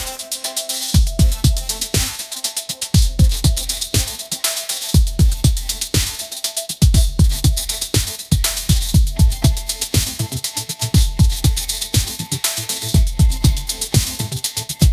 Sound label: bright pop synth hook